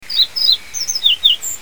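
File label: Japanese yellow bunting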